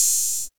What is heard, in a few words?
ELECT PERC; OMI - Universe of Sounds